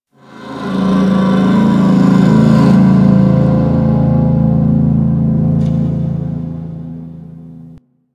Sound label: Infested Distant Metal Groan, Ambience; Warframe; Corpus Infested